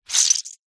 paper towel wet squeeze squelch; foley; zapsplat

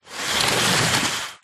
office chair on carpet roll

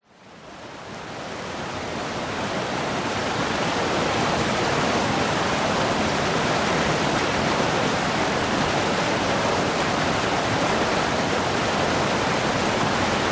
river flowing over rocks vietnam

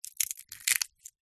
food nut monkey nut shell crush; zapsplat

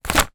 leisure game twister game sheet barefoot lift up; zapsplat

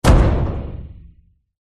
impact slam hard metallic smash against; zapsplat

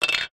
leisure toy plastic brick small set down table

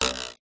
cartoon twang spring; zapsplat

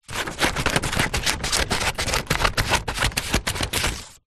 office scissors small blunt cut paper sheet; zapsplat

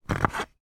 game plastic letter tile rack pick up from board; scrabble

